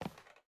Step Heel Wood; Game rip; MiSide

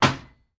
Window Knock; Game rip; MiSide

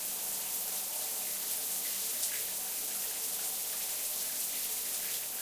Shower Restroom; Game rip; MiSide

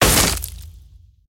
Destroy Corridor; Game rip; MiSide

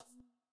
Button Enter; Game rip; MiSide

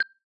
Tick; MiSide

